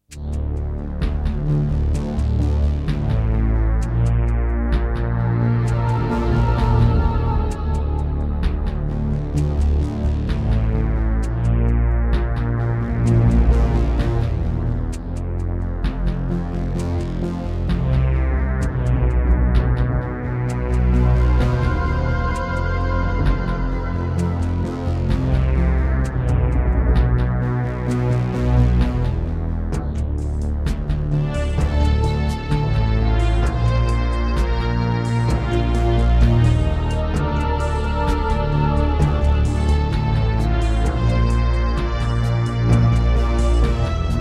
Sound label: Serdaco DreamBlaster S1; Descent II - Briefing